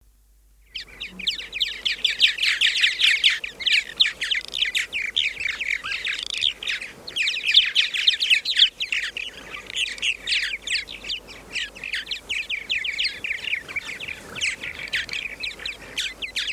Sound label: purple martin, songbirds